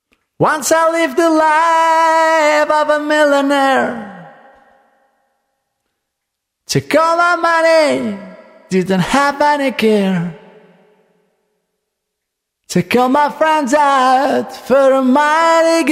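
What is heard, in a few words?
Male Vocals; SM Pro Audio – Tube Box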